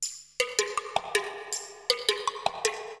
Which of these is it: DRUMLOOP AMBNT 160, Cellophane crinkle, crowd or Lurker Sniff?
DRUMLOOP AMBNT 160